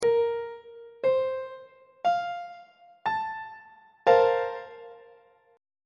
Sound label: b flat major 7th suspended 2nd chord; on treble clef